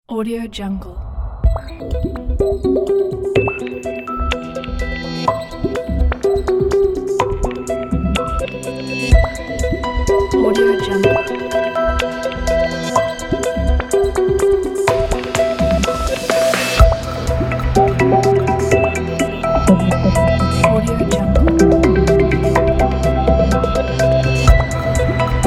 atmospheric mysterious ambient jingle

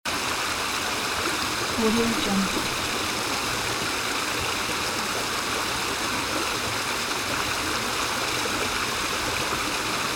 stream; stefanocremona

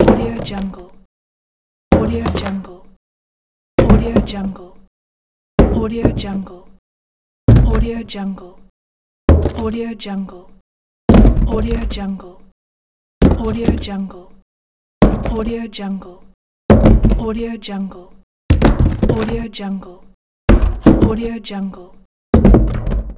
paperbox falling; debsound

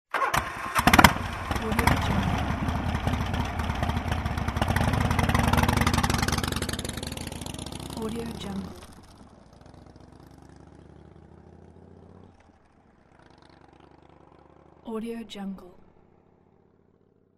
motorcycle; cat track

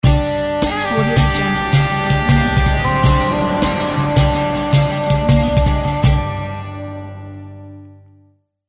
arabic logo

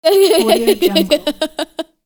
girl laughing

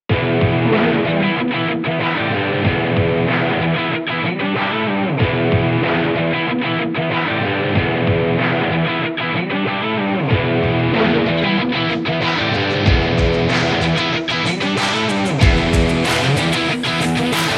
aggressive and powerful rock trailer

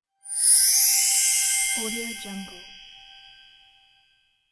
magic wand spell

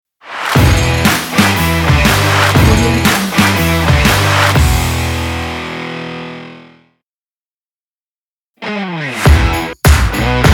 opener ident logo pack

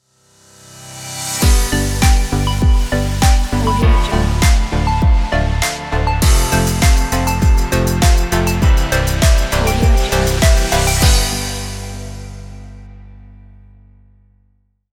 calm dance logo

